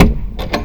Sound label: Treasure Chest